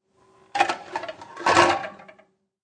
Load Dish Wash, Dishes and glassware clanking as dishwasher is loaded.; Dishwasher Loading; Kitchen; Household